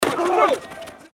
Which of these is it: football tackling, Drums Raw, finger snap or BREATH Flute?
football tackling